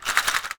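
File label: SHARK CALL, ethnic percussion; WorldSounds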